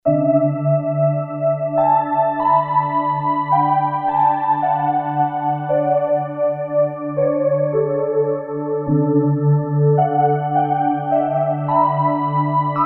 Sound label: Somewhere JX, Roland JD-990; Vintage Board